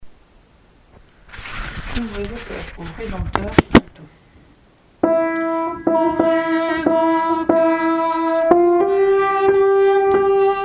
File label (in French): Ouvrez vos coeurs au Rédempteur ALTO; entrée envoi couplets 1 et 5